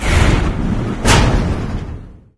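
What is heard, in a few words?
elevator close; dm subchamber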